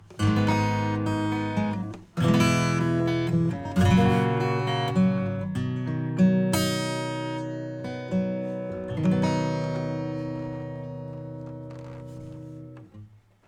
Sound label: 808 acoustic SUB; SubBass Doctor